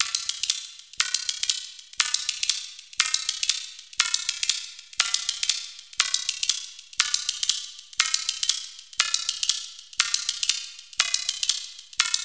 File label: WET HIQ, HIQ BEATS; Zero G; Malice In Wonderland